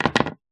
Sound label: screwdriver drop